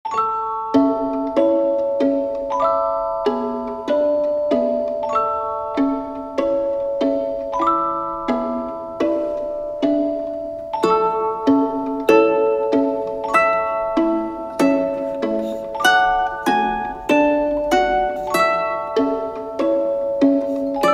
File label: baa baa black sheep sleep time instrumental; nursery rhymes